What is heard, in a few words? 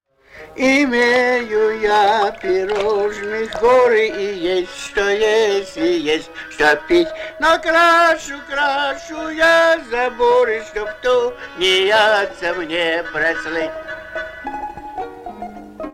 king song; Vovka